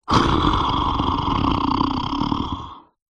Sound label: horror yeti monster growl snarl; zapsplat